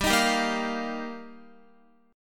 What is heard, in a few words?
G Minor 7th Sharp 5th, Guitar; Gm7#5; strummed; x,10,13,12,10,12